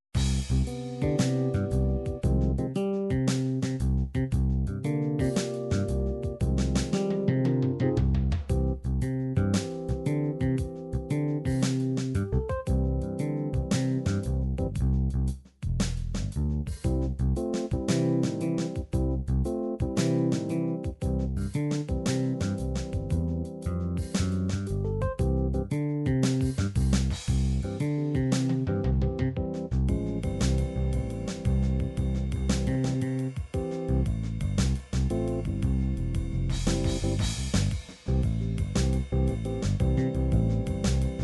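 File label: dance funk shuffle, midi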